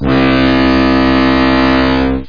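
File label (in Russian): низкий звук гудка лодки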